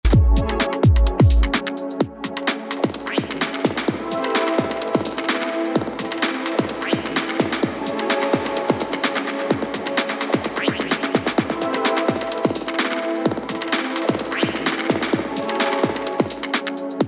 Master Isolator Mid Send Echo Verb

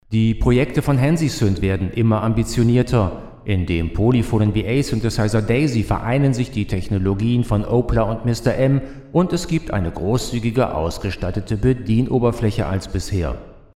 Reverb Medium Room; Mackie DLZ Creator XS; Default Atomizer

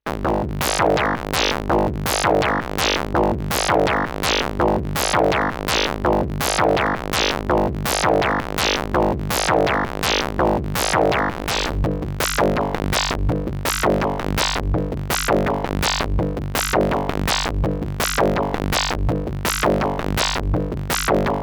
DFAM vs Edge Sequence HP

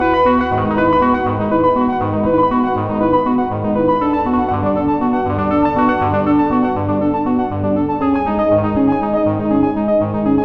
VSM IV Roland RS202 Sequence; GForce; Japanote

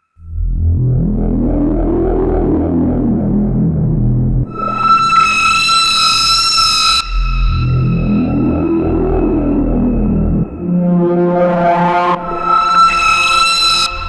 UNO Synth Pro X Presets

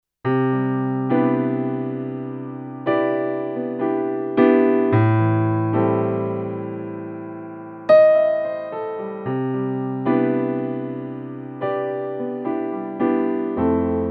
Yamaha CK88 S700 Piano

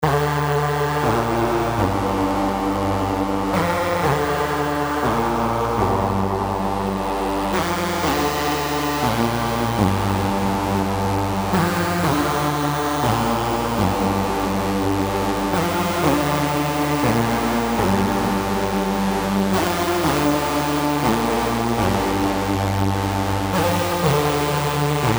DnB Transformer; 174

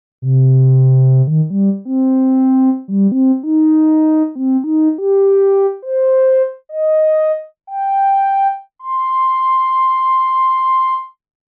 Kobol Ney no patchcords

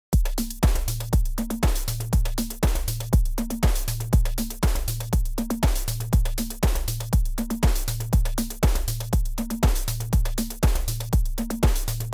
NTS-1 mkII Drum Original

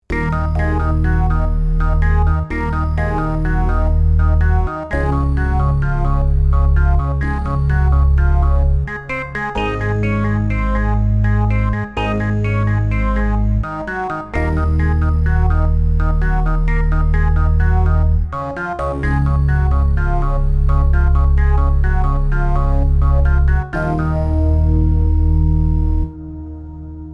PPG Wave Computer 360 Percussive

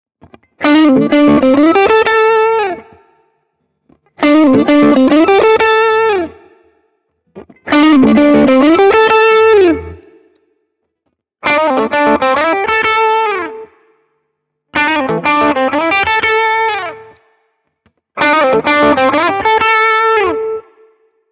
Ibanez AR520HFM LBB crunch all positions Licks

